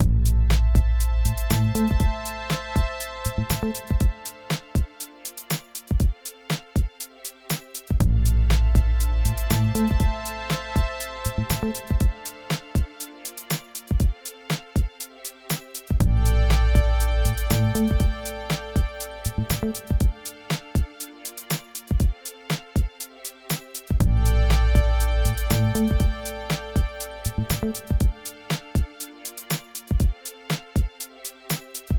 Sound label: ambient journey; Sabotain Break the Rules